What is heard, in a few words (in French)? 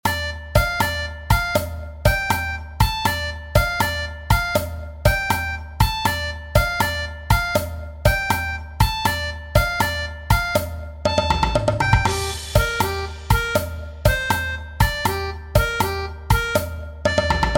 12 Barre Blus Shuffle Penta Re mineur Diato DO